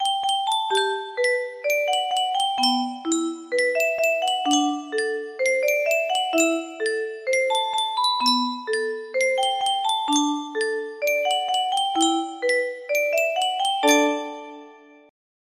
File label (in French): Yunsheng boite a musique